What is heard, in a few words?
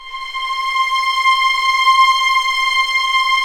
STR, Vlns Orch; Roland L-CD702